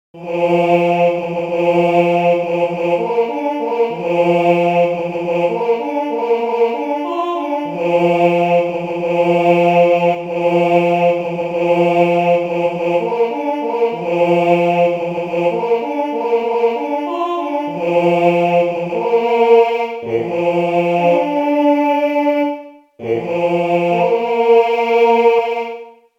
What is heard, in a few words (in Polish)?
Jenot na rozkladzie, sygnaly mysliwskie; sygnaly mysliwskie ze slowami